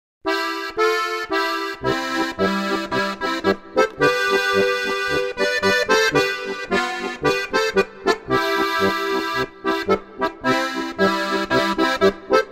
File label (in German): Polka